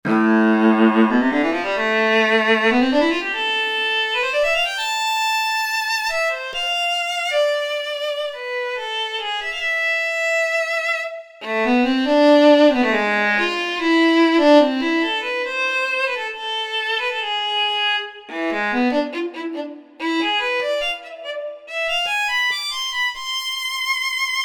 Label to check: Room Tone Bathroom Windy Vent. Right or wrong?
Wrong